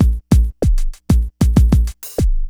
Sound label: Urban Beat, Drums